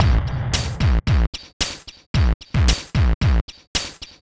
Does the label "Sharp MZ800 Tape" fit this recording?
No